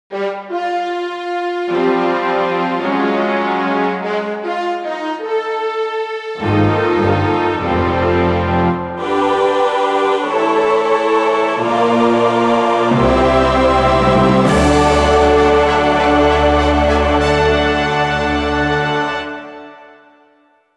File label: Clear Fanfare; Fyrus; The Legend of Zelda: Twilight Princess